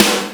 snare, DnB Breakz Kit; Breaks N Beats Sound Kit

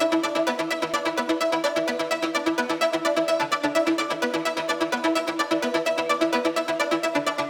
Melody 2, 128BPM; Jelly Kit

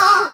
Synth Voc; Vengeance Samples; VEE Synth Shots

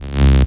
Synths C#; Vengeance Samples

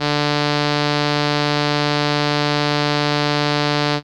Trumpet D3, ARP Solina Unmodulated; musicradar-synth-samples

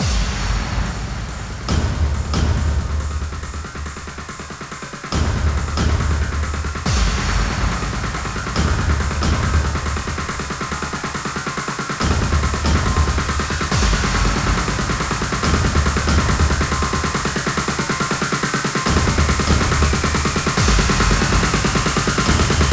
140BPM 16Bars, Hands Up Trance Fills; Terminator; Vengeance Samples

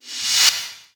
Sweeps Short

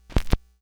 Needle Pop, Vinyl Record Samples; Needle Noises

